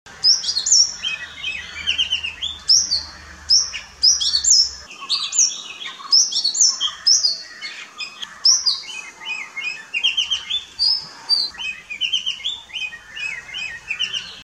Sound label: Oriental magpie robin